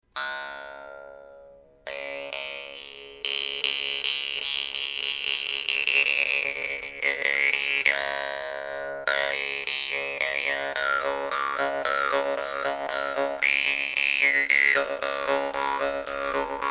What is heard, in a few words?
vargan; russian vargan ustinov big